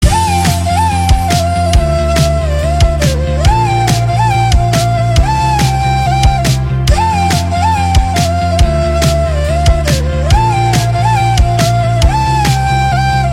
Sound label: Bairan Instrumental